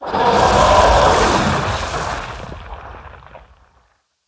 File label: Mana Cyclone